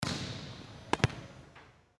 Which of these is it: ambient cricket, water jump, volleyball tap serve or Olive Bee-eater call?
volleyball tap serve